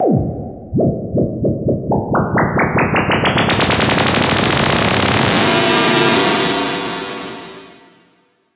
fx clangers

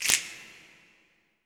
snap afro, afro snaps, hands; Roland LCDP14 Africa VOL-2

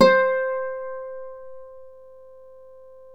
Celtic Harp, Plucked Strings

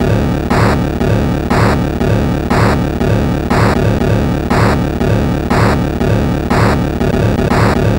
Atari 2600 Noise Drums

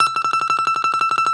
balalaika, Russian Strings; World Combos; E-MU Producer Series Vol. 7 – Old World Instruments

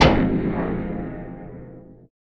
BASHED, Impacts, Hits & Booms; Distored Reality

